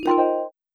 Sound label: xp Sound